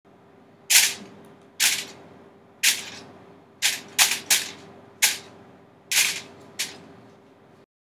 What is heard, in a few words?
Walking